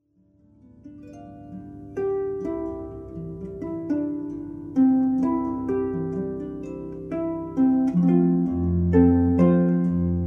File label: harp